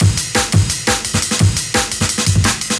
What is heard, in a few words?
Loops, breaks; M8